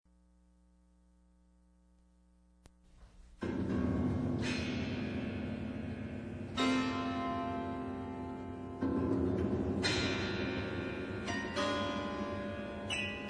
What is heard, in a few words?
concerto piano strings